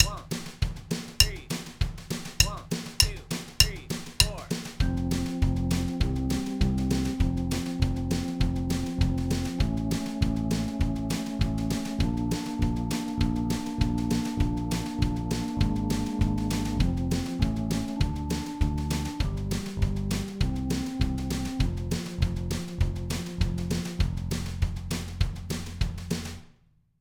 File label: mean mother blues; backing tracks; my own solo